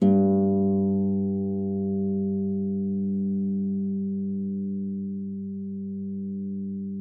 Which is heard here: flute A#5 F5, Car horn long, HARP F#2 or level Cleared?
HARP F#2